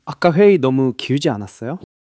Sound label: struggle